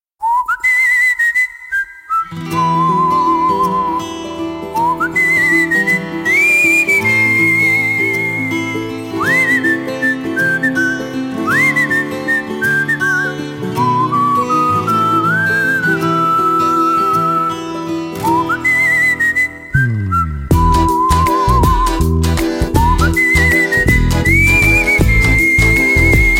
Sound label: Waltzing Whistle